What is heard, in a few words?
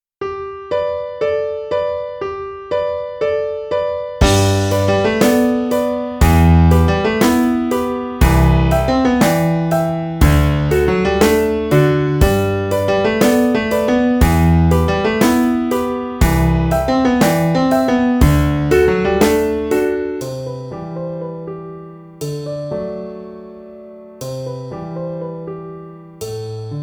pop piano drum kit